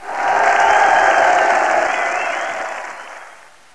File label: crowd cheers